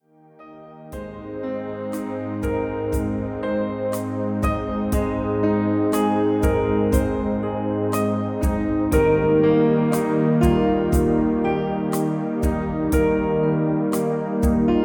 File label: Piano Dreams